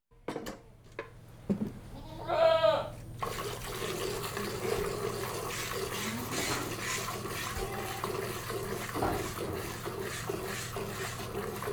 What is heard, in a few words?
One Goat Hand-Milked - Milking; Livestock; BBC Sound Effects Library